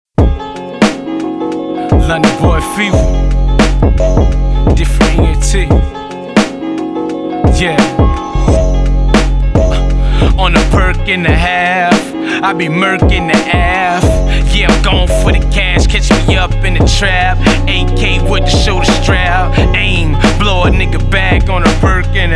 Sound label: PERC N A HALF